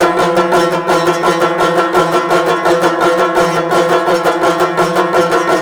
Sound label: banjo; zombat; danger